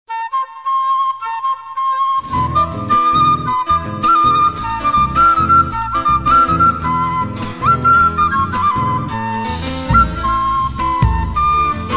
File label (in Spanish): Flauta